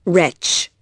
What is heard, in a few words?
retch